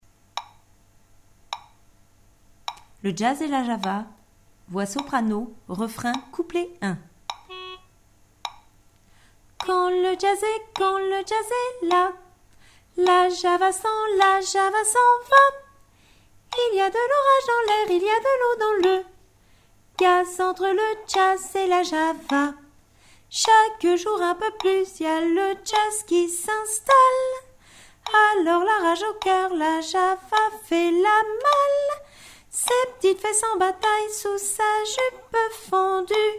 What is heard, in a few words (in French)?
soprano